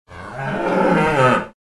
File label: cow angry